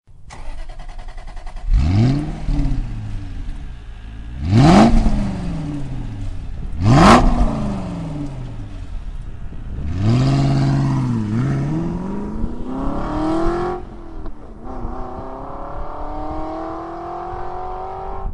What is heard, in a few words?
v8 vantage engine; ringtones